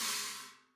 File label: China Choke; ZKCC 17; apulSoft apTrigga2; Multi-Velocity Cymbal Samples